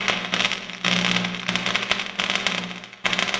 laser; vendetta; defect

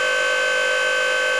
lift run; ambience; blackops

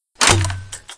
rpg reload; weapons

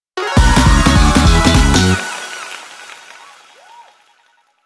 qualified; fallguys

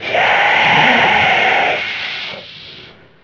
attack, evoskeleton; custom monsters

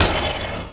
lift open; vendetta

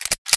g3 boltback; weapons

